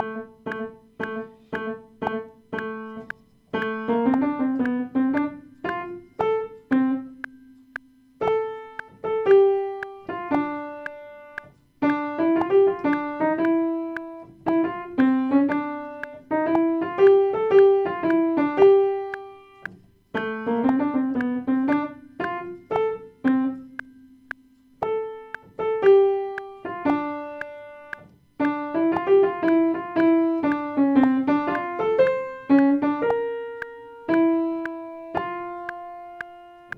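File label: soprano 1 practice, piano practice; christmas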